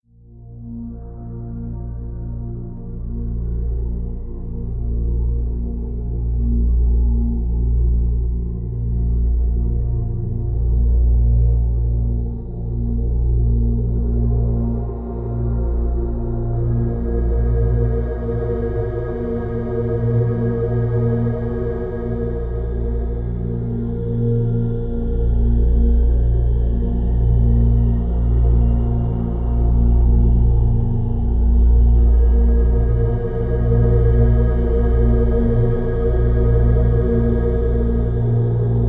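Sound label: Drone Coma (Bb); Brand X Music; Brand X Music Catalogue - Editor's Essentials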